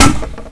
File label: boom shot